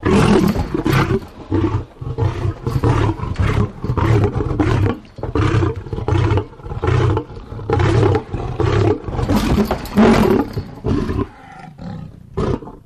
Lion Roar Big Idles; big cats

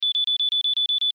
Alarm Beeps

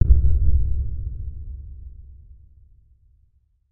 Bass Impact Low Drum; impacts

